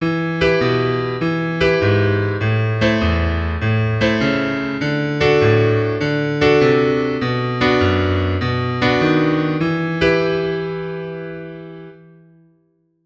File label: Walking Bass